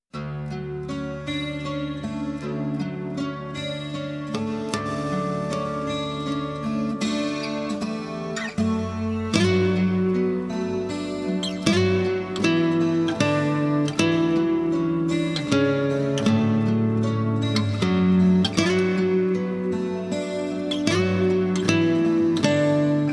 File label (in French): L'oiseau Bleu (Guitare)